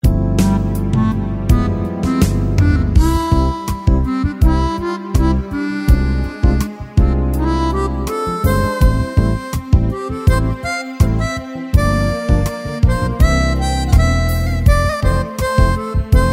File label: MHrp, Yamaha YPT-400; Factory Songs